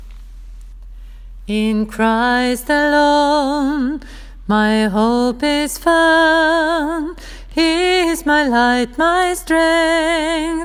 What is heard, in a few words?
bass; åfjord